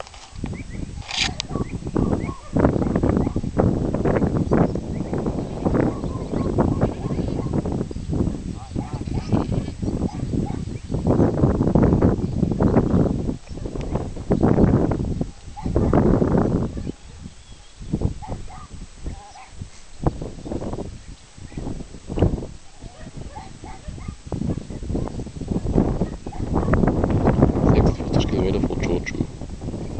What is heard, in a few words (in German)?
Schafherde auf Huegeln; O-Ton